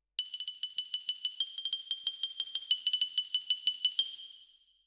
Xylo

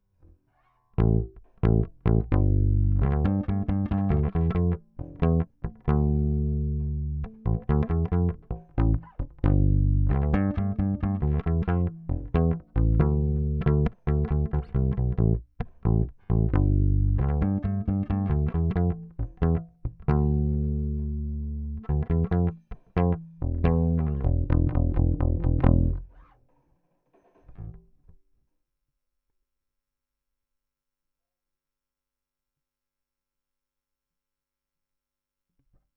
Bass DI

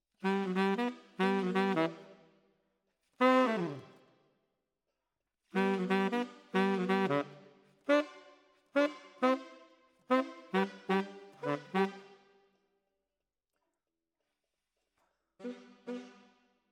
Tenor, RNR for piano

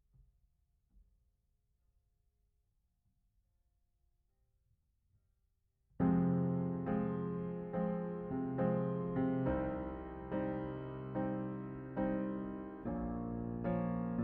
Piano Mono

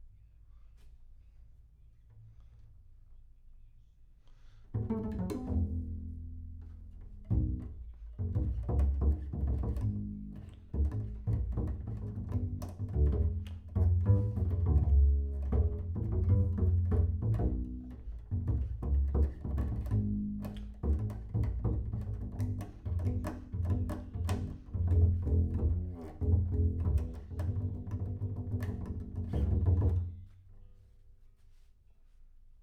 Bass Room

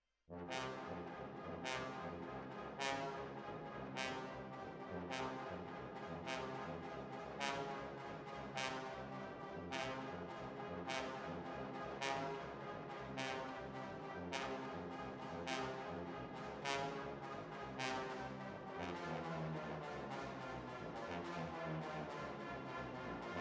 Hall

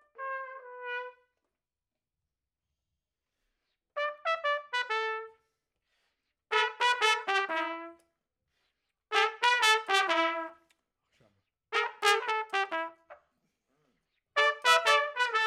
Trumpet Room

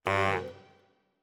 Bari, RNR for piano

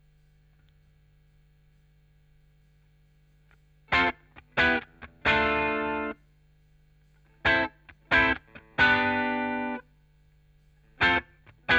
Chorus Gtr

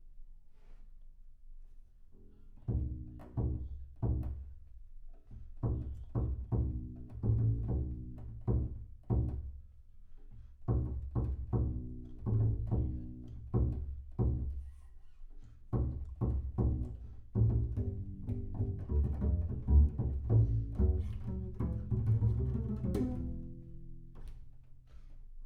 Bass Room

